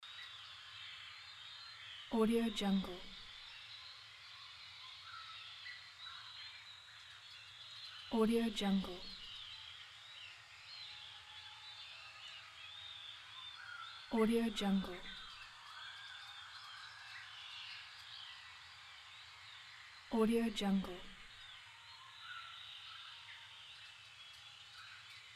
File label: forest loop